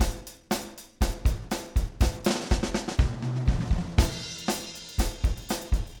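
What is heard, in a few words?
Room fill 3 bars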